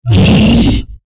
hit, troll

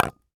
set, figures set on table

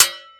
sword strike; weapons

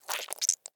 damage, spider

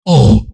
pain, troll

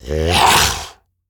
zombie skeleton

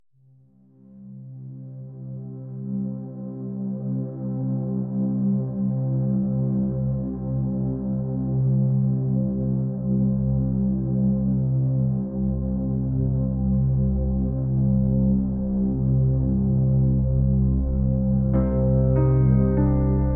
calm

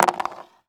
die roll, dice

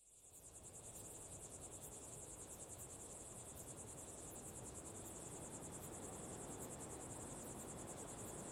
desert; ambiance

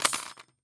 drop

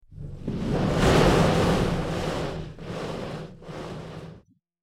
breath, dragon green tiny

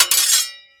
sword strike; weapons

